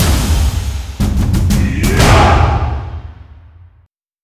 Mission Start

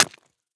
Crab Attack Hit; We Are The Dwarves

